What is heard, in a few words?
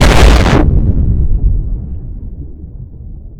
nuke det; quake2; kitsune